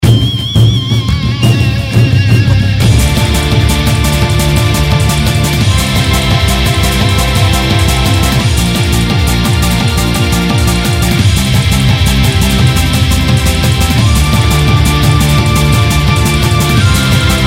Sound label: bgm war